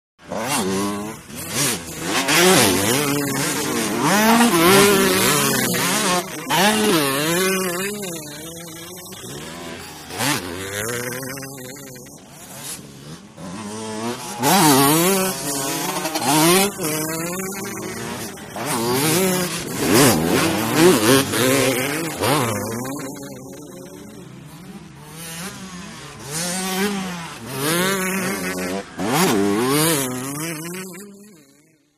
Motorcycle Motocross; By 125 Cc ( Several ), Cu Fast Bys R / L, Shot Cu.